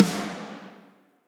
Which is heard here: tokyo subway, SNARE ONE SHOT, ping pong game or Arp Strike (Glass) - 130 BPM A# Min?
SNARE ONE SHOT